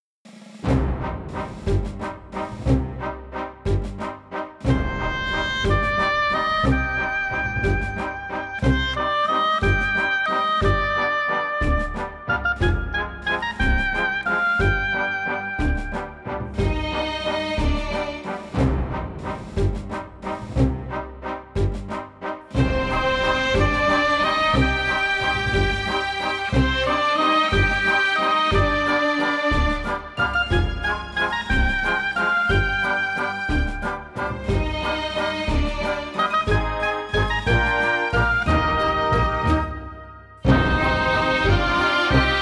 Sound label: Oboe Waltz